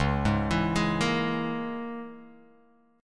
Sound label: C sharp Minor 6th C sharp, Guitar Open F; C#m6; arpeggiated; JGuitar